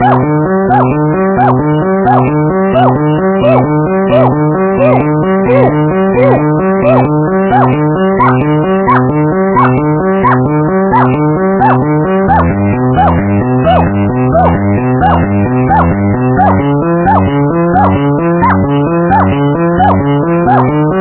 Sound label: Spanish Romance; Sors